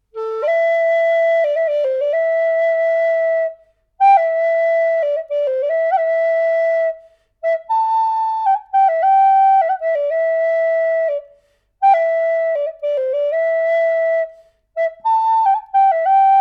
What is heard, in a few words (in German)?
Flöte NAF art Stimmung A 440 Hz